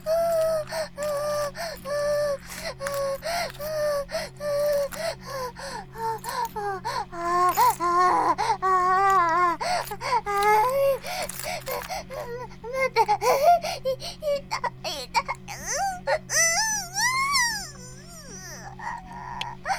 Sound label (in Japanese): バイブ放置; イクたびにわけて